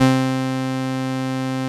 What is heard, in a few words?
505, String Machines